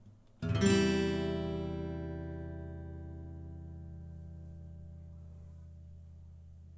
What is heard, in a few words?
Guitar